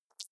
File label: hover high, signale